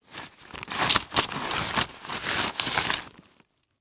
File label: Metal Stake Sliding Into Paper Bag; Sports Stake